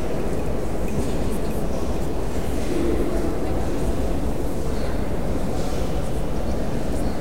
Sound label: hangar, ambiances; CosmicRage